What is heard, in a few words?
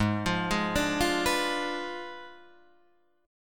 Ab Diminished 7th, down, Guitar, Drop D; arpeggiated